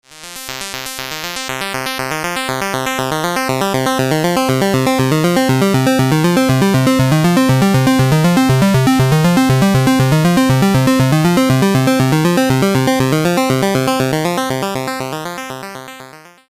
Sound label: vco pw